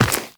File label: Run Dirt, Footsteps; Warframe; Tenno